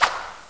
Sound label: Ambient Swish Hi